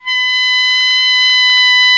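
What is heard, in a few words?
ALTO pianissimo C 5, Sax; Giga Samples Collection; ALTO SAX PP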